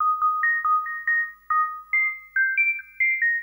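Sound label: Trance, Techno Melody; TechnoMusicMaker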